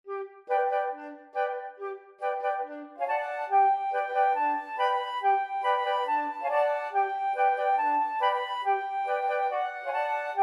flute choir

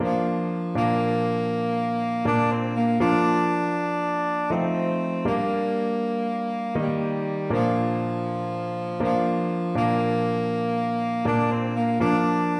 amazing grace, tenor saxophone

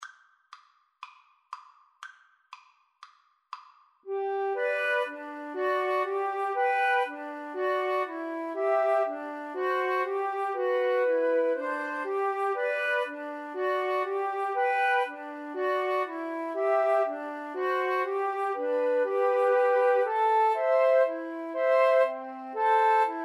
Flute Trio

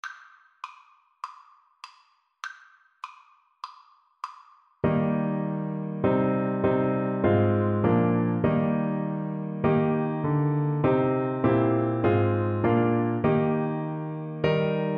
fairest lord jesus FL kar, Flute

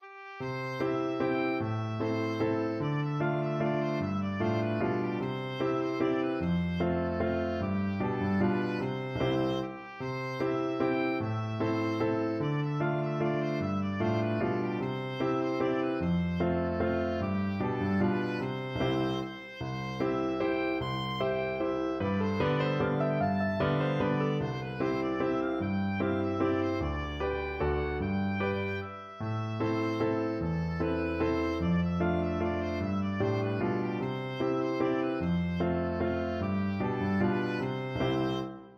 beauty in tears, oboe

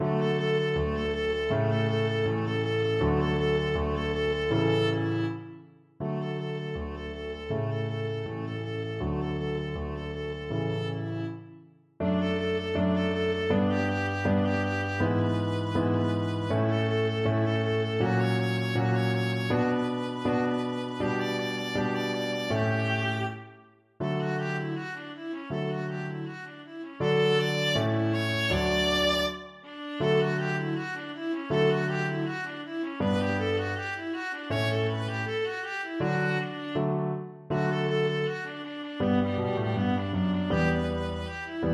concerto for mandolin in C viola

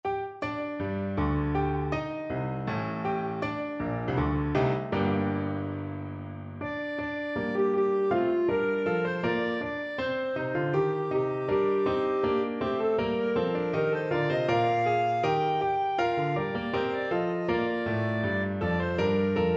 clarinet; high germany

